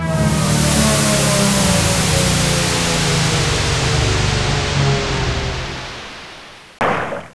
falling

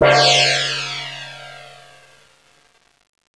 CYMBAL; Spanish Net

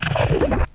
Gurgle; 250 Desktop Themes

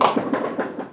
pin; Bowling

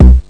tr-909 low, bdrum

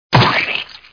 SPLAT

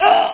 Aah

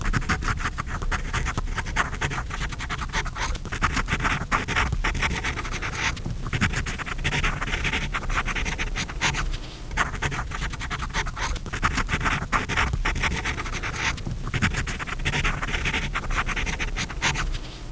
SCRIBLE

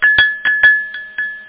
tinkle; 7realms; interactive fiction

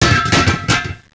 Clatter; Princess and the Pea; SoftKey

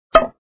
wood; tom jerry bowling

